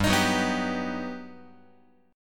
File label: G Augmented Major 7th, Guitar; G+M7 strummed; JGuitar